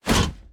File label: axe jump land attack; player; unsighted